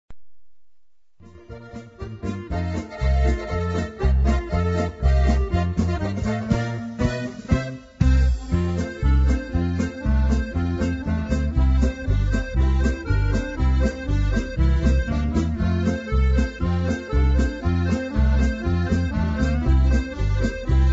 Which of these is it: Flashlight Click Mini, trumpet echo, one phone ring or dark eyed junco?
trumpet echo